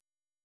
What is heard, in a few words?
15khz